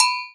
Agogo Low Open; Digital Sound Factory

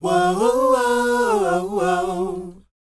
WHOA F#, Vocal R&B & Dance; ILIO; Vocal Planet

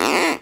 Duck call, cartoon Foley Fx

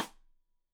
Side Stick Stereo; Deeply Sampled Drums

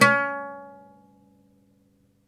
OUD C#3, Plucked Strings; E-MU Producer Series Vol. 7 – Old World Instruments

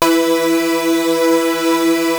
Prophet 5 B4; Hollywood Edge - Giorgio Moroder Rare Synthesizer Collection

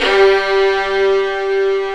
VL SEC G2; AKAI S-Series CD-ROM Sound Library VOL-7; CELLO+VIOLIN

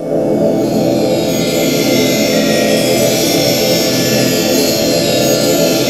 F3 vox bel; Northstar - The Wizard VOL-1

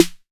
808 SD Tape, Snare Drum; Goldbaby Tape808 & Tape909

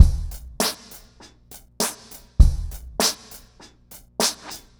Remixed Drums 100BPM; The Roots Drumloops

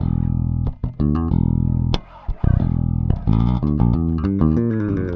Rock, Bass; Bass X

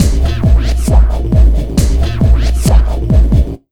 Big Beat Drumloops, LOW 135; AKAI

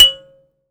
Finger, Prepared Kit; Battery 3 Sample Kits

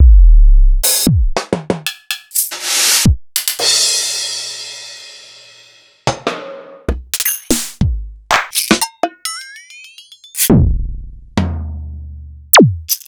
EMU Orbit

